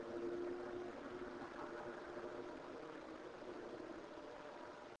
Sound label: Laugh; north bend fire company